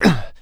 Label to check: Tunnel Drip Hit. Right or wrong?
Wrong